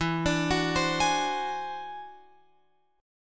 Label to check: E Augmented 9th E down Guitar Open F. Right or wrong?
Right